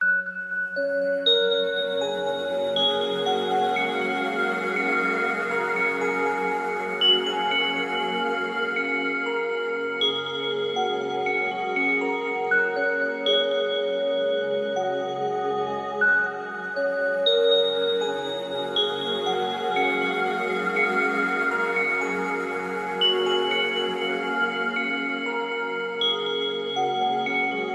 sound design soundscape sleepy happy content twinkling musical relaxed chilled; zapsplat